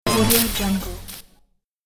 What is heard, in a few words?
robotic revolution